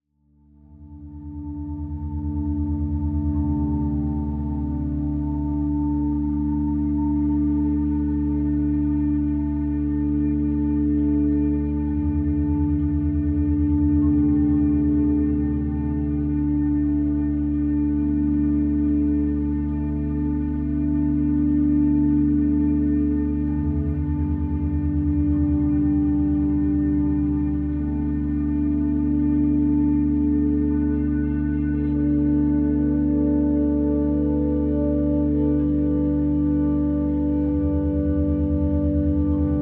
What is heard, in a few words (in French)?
Orgue